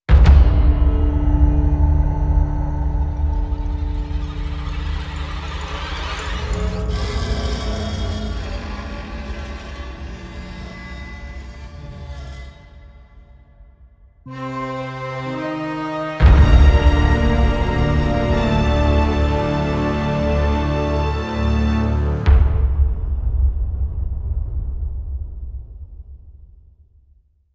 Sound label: Round Start Intro; Revelations